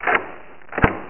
lock

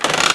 switch; Odyssey

